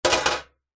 industrial tool metal spanner or similar drop on hollow metal surface; zapsplat